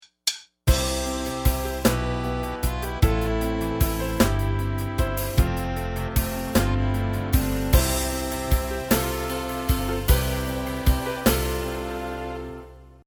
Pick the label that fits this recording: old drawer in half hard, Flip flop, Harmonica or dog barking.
Harmonica